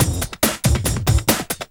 Loops BB 140, BreakBeats; Vengeance Samples

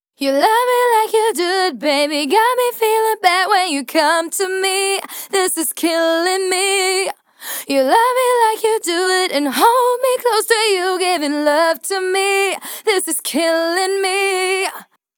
Female Vocals Chorus DRY, 128 BPM; Vengeance Samples; VTDS2 Song Kits

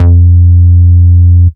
Root F, Bass

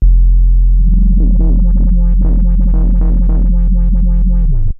Roland B F1, Roland S100; musicradar-synth-samples

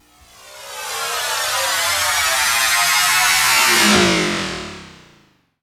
Uplifter

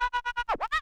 Transform Med, vinyl record cuts longs

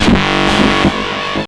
Acid Sounds G, Synths; Vengeance Samples

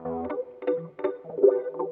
rhodes licks 125; PS-28 Vocal House Samples; instrumentloops

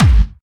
BD Punchy; Vengeance Samples; Bassdrums

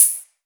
Open Hihat; Vengeance Samples; VEE Cymbals

